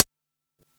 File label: Quasimidi 309, Drums